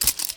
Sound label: hook return; casualtiesunknown